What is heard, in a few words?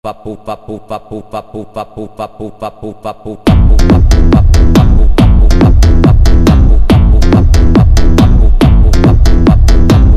automotivo